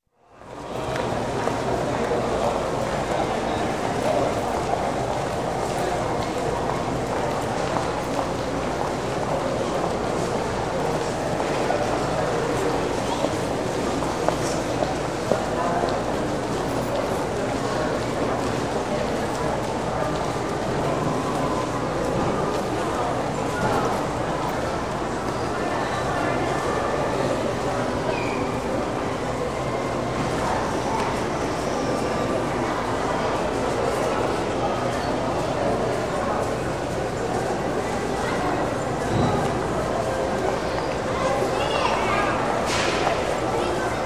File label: Shopping Mall Large, Indoor General Ambience; Store; Sound Ideas 2000 Series